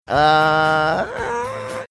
ahhhhaahhh

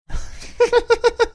cute laugh

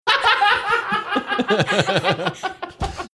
having good laugh

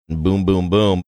boom boom boom

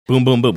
boom boom boom

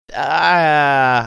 making funny sound

